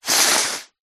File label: human child girl 10 years old sniff snotty cold